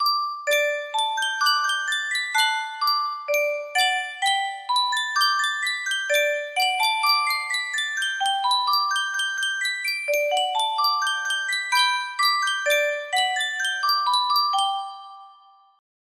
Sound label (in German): Spieluhr